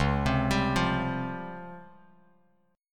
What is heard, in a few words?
Db Major Sharp 11th Db Bass Standard; 9,15,17,10; arpeggiated; chord shape; JGuitar